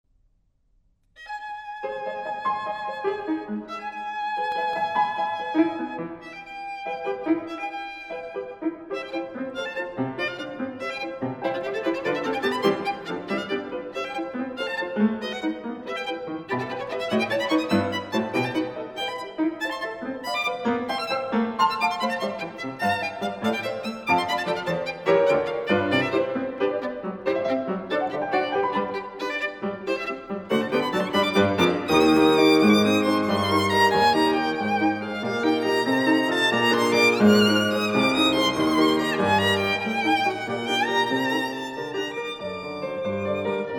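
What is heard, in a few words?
violin sonata; raff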